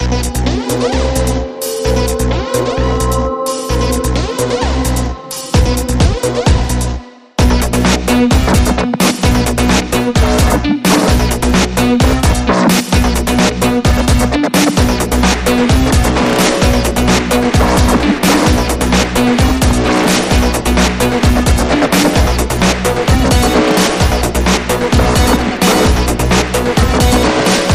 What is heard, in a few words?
Bass